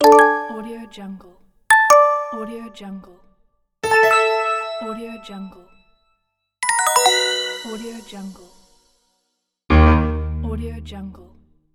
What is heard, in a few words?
app menu sound